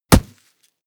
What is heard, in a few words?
body fall grass hard; krieg-waffen; nahkampf; soundpool